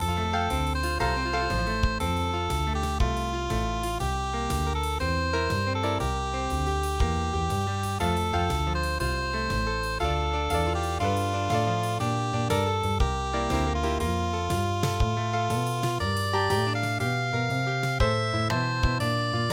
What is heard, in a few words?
harmonica blues; midi; traditional